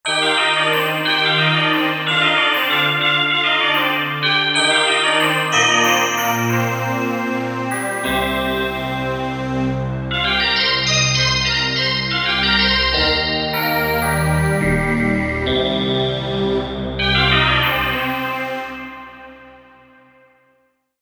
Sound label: Digizip Twists